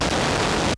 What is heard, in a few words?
Digital Noise, layering samples